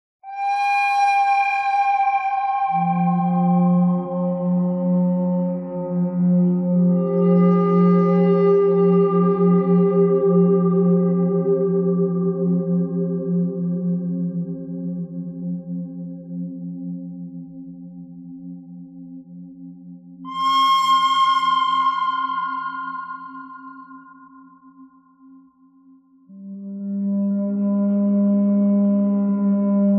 bird thread harmonic; birds

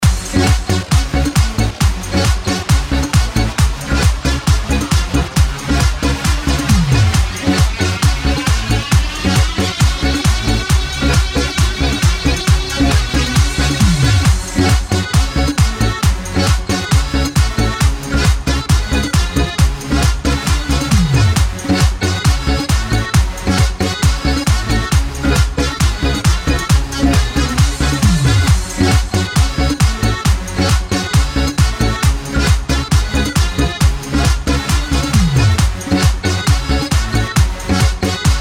Latino Tropical

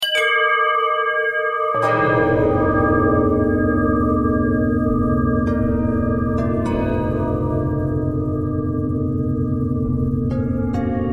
bell twist

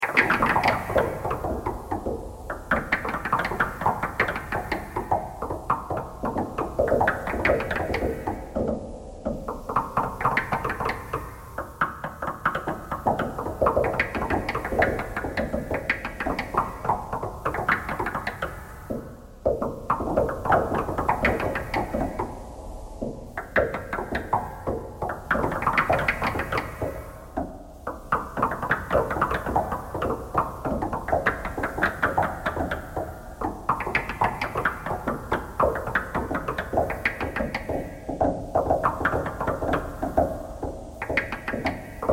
knocking thread noise all

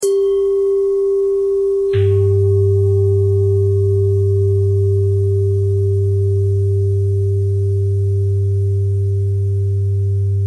bell bell thread simple, bells

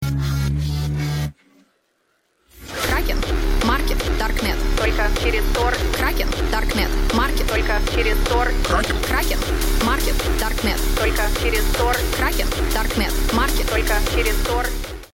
hardstyle; kraken

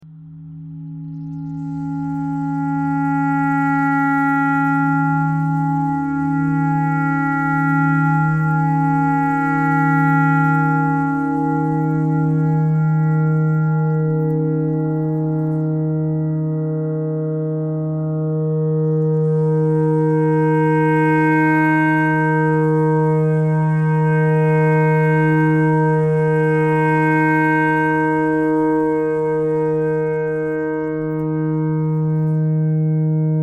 clarinet clarinet notes e thread pentatonic

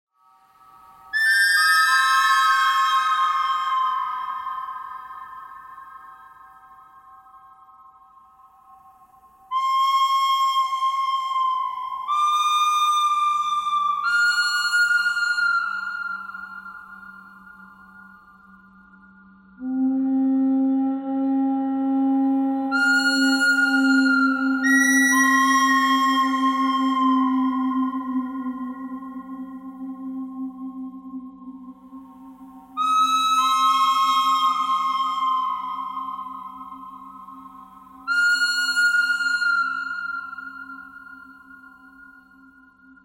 birdcry thread harmonic all; birds

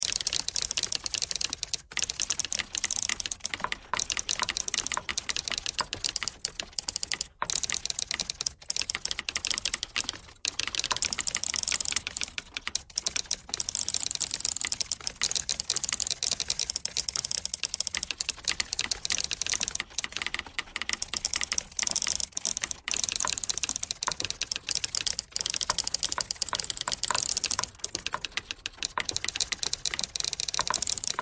typewriter thread all

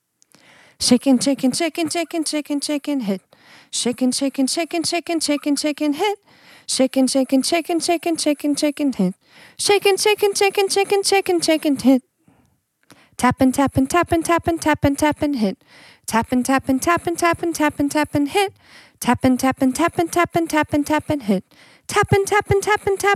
Shake and Hit

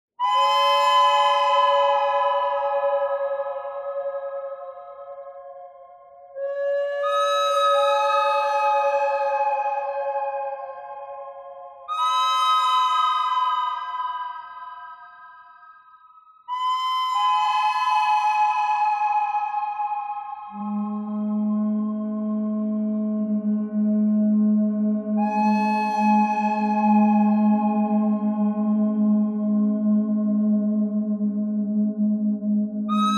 bird thread pentatonic; noreverb

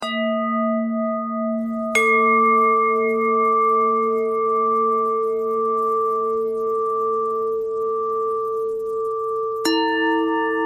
bell bend threads

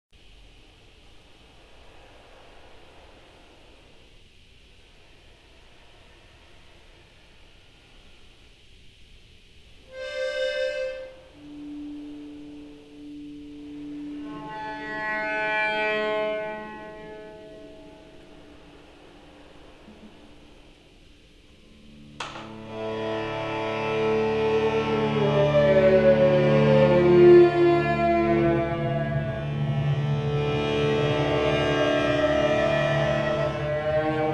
accordian on edge

